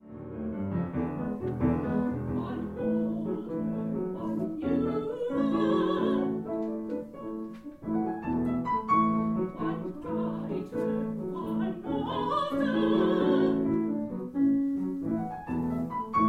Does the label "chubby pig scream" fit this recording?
No